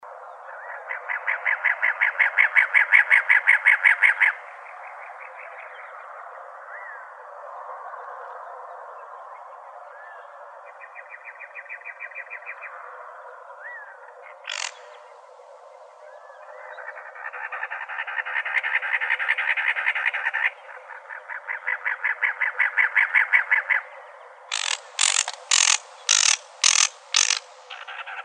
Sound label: Wrens Kinglets Gnatcatchers; CACW; deviche